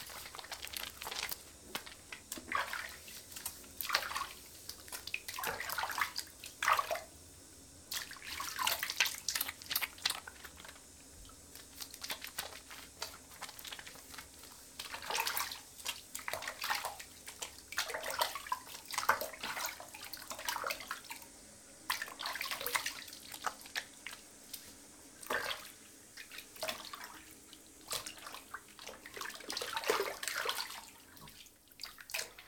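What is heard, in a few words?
London bath evening (Bathing added); The 39 Steps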